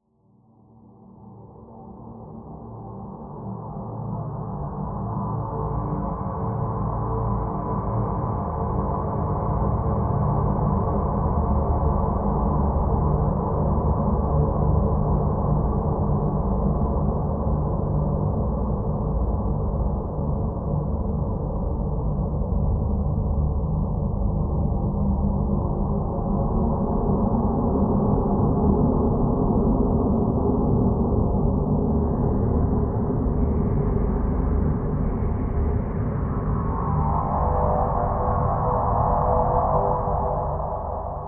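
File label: Drn Dimensional Simple G, drone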